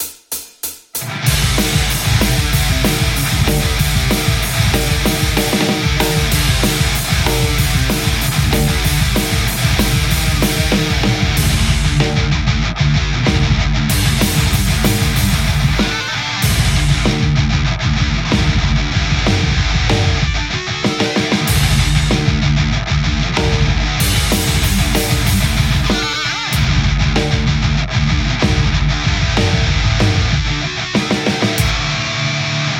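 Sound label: metal Telecaster; ndzeit; dirthead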